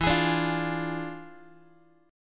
E 7th Flat 9th E down Guitar 4A 4E 5A 5D 5G sharp 6C sharp; strummed; chord; JGuitar